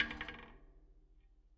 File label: Spoke Bounce, Bicycle